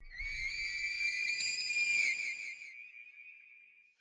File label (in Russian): bezmolvie pustotyi zatem voy; zvuki meteorita asteroida